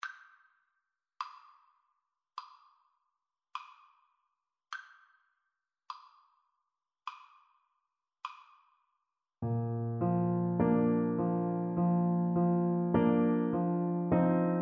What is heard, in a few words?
Tuba